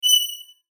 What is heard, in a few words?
Beep High Pitch; Production Element Imaging Element Accent Transition; Multimedia; Beeps